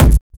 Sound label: kick, Drum and Bass